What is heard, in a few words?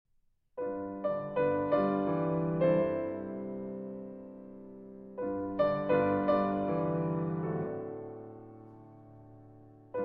For Piano, Larghetto Espressivo; Onovwerosuoke 5 Kaleidoscopes For Piano No. 3